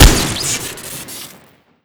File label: smg shoot crit; weapons